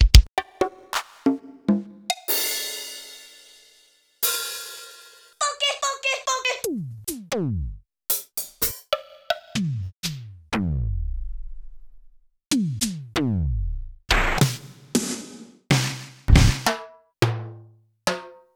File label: Yamaha PTX8